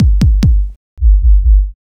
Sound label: kick